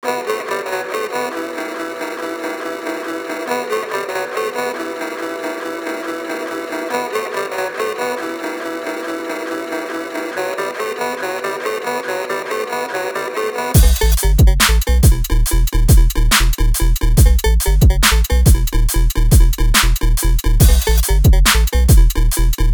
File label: Electronic; Electronic Vol4 Music Pack